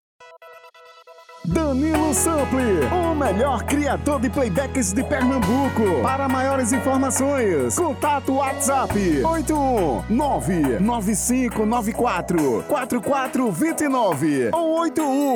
Brega tom feminino

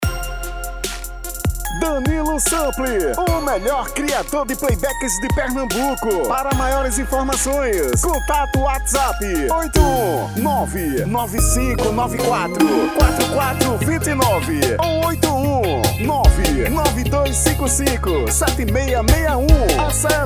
Versao PISEIRO

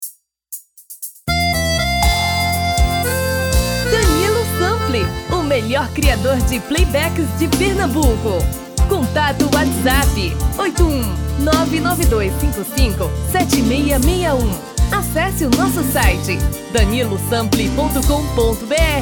forró; Forro um tom abaixo

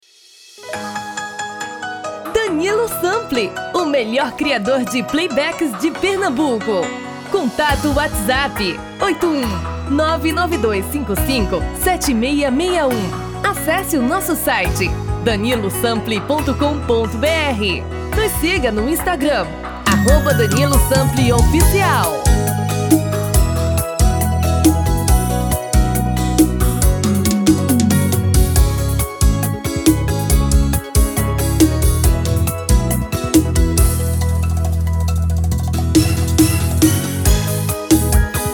BREGA

